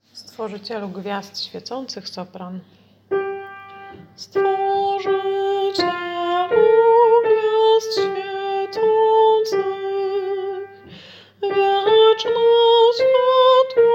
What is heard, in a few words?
Sopran; Stworzycielu gwiazd swiecacych; Adwent